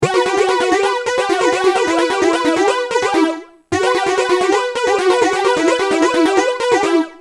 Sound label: PH350; x5dr